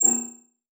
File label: Multimedia Internet CD-Rom Flash Beep Electronic